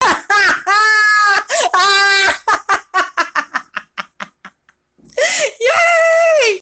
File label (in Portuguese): risada